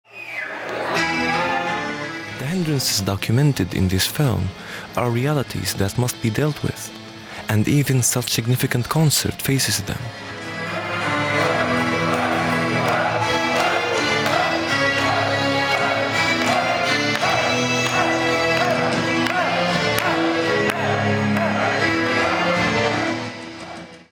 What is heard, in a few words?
syrian metal